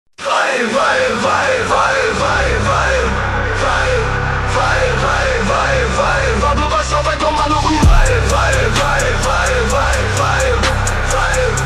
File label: brazilian phonk groove phonk music; Фонк